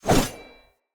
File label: Short Sword Whoosh; Enemy; Weapons; Short Sword Elite